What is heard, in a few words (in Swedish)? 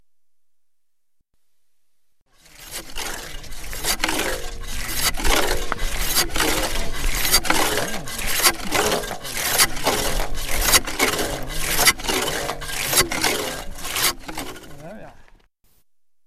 Issågning; stockholm